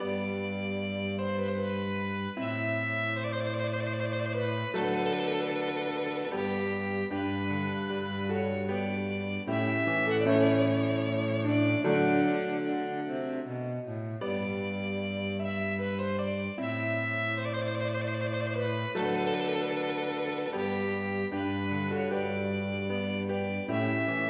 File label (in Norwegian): Aire Lentement for fiolin, cello og piano; Aamodt